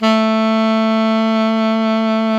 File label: SAX ALTO MP, Alto Tube; Roland LCDP07 Super Sax